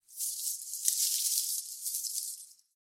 Leaves and Twigs Rustle; SoundsCrate

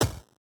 8-Bit Gun Shot; SoundsCrate

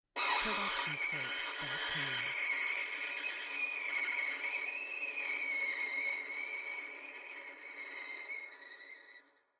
Violin Scary Movie

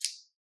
scissors

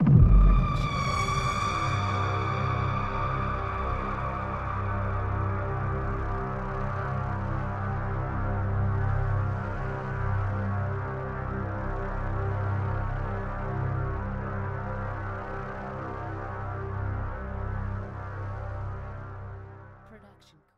Suspenseful Ident; soundscrate

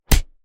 Fight Impact; SoundsCrate

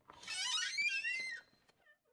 squeaky wooden door open quick; soundscrate

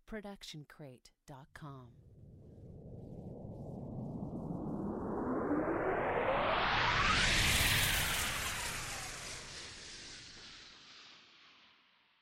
Cinematic Riser

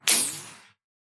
bullet impact ricochet

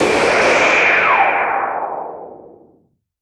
Anime Super Blast Off

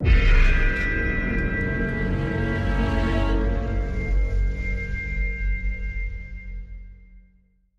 Suspenseful Ident; soundscrate

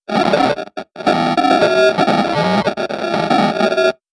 computer glitch

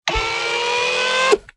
Robotic Motor; SoundsCrate

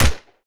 Action Gun Shot

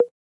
plop; soundscrate

